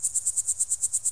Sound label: crickets; ImpGal2; colony